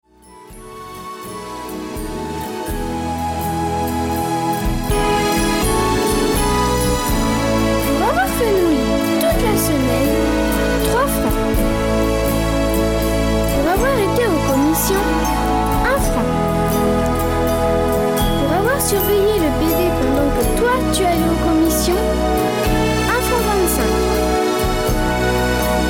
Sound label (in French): Voix D enfant; Without lead vocal, with backing vocals; Original tempo (BPM); Ending without fade out